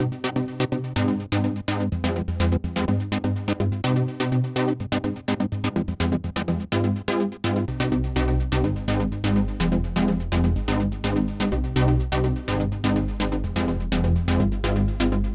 M12 Lovely Stutter Wet; filters bundle

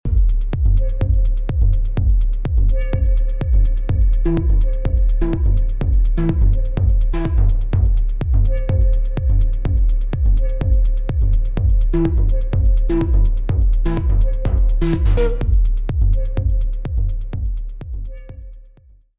Mini D6 Dry; filters bundle

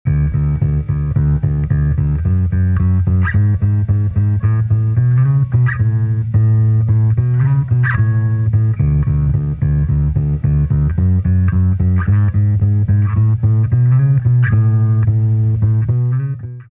Electric Bass

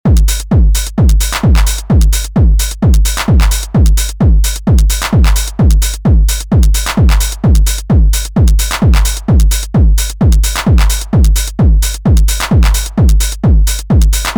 909 Bus; TridA Indus

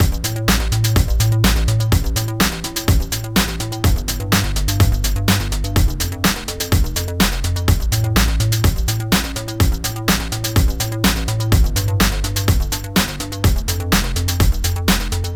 M12 Ratchet Club Dry; filters bundle

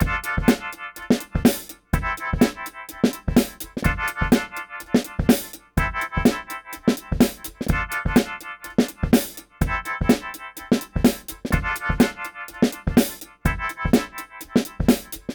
Mini Used Speaker Dry; filters bundle

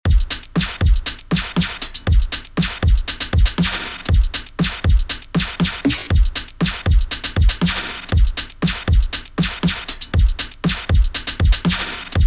Audio Zapper Wet; filters bundle

